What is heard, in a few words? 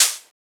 Open Hihat; Motivation; Cymatics; Drum One Shots